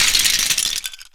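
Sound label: debris large; LEGO Star Wars Force Awakens; Junkbot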